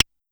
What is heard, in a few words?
Pollard Perc, Syndrum